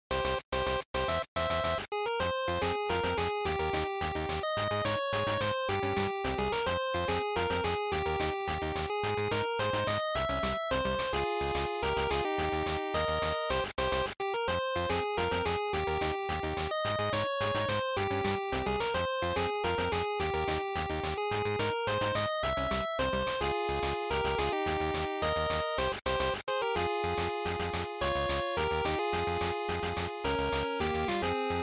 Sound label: GB Fighting Stage